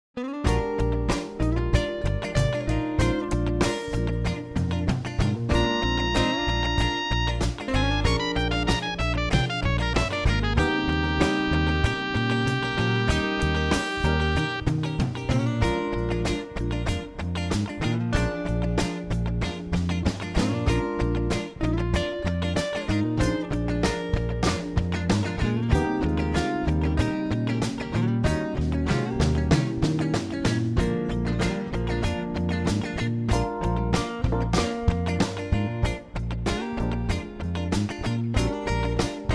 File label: Diminished Dominant Pattern; Coltrane